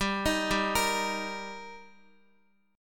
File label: G Minor Sharp 5th, Guitar; arpeggiated